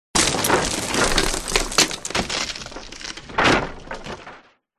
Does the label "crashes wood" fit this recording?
Yes